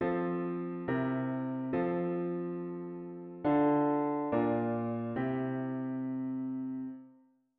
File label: diat root w bass